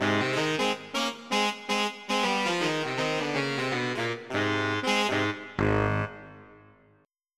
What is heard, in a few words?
SAX; 16 Bit Digital Sound Masterpiece